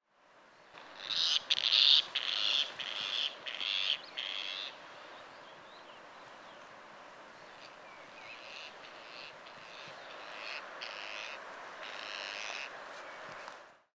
Tibetan Partridge Perdix hodgsoniae, Ladakh

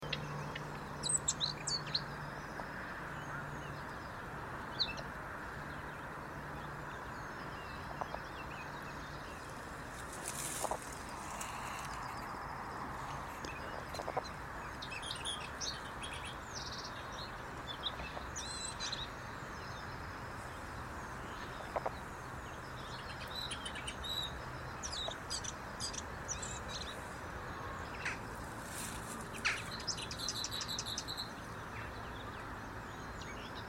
Lesser Whitethroat Sylvia curruca, Ladakh

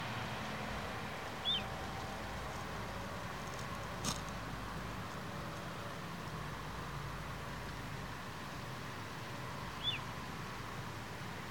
Little Ringed Plover Charadrius dubius; Ladakh

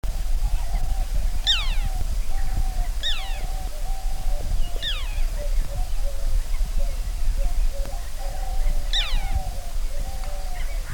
River Tern Sterna aurantia, Ladakh

